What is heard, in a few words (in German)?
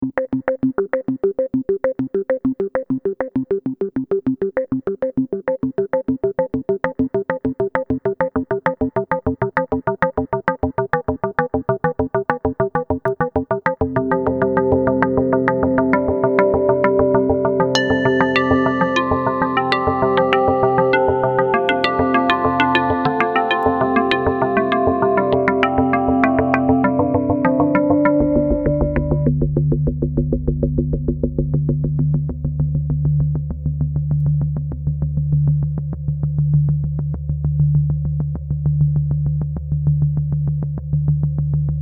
FM Einblend dann Noise ARP